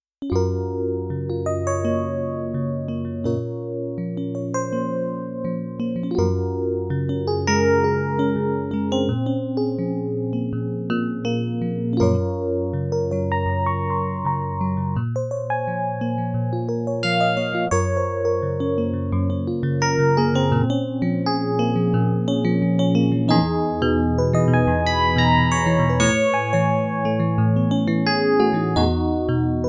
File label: Nord DX7 Full Tines